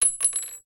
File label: cart